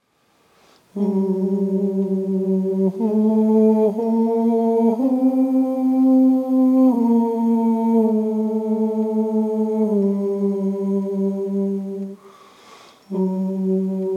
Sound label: Wexford Tenor; Wexford Carol